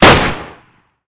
tau fire

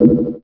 9mm clip

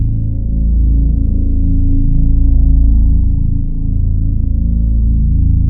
drone dread

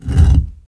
swtich

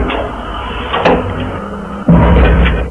hiss start move stop

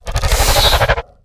breath; gonarch

